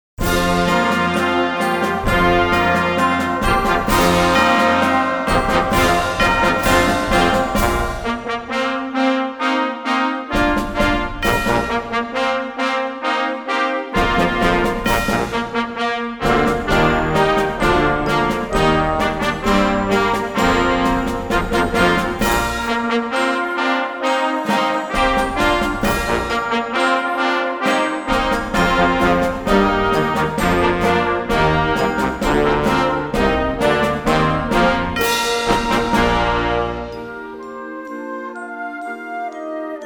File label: band fanfare